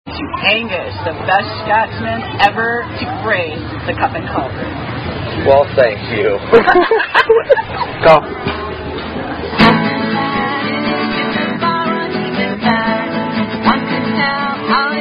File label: Song; SCA Singer